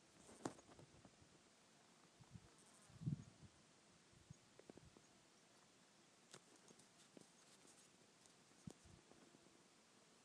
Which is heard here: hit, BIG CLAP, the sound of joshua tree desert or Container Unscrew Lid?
the sound of joshua tree desert